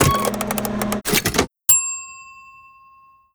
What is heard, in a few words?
present, gravity glove machine; mechanical units